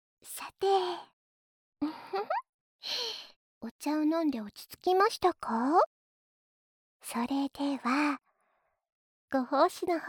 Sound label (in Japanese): 吐息; まずは、私達の吐息を味わってみてください